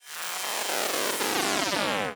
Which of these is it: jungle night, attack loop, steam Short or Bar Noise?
attack loop